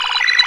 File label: Sci Fi FX; Proteus 2000; Digital Sound Factory Proteus 2000